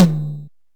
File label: Yamaha RM 50 Toms